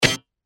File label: UI invalid sound